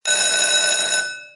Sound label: win ring; games; poker; American Poker The Legends II